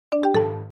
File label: Msn Messenger, Sms Tones; Ringtones